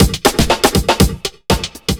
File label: chemical 120, loops 120bpm